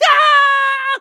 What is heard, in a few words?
scream; Scrat